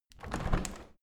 window handle open; objekte; fenster

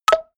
notification signal; kommunikation; signale

